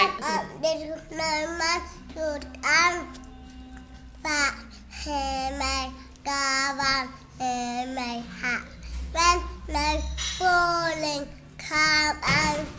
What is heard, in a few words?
i m a little snowman short and fat singing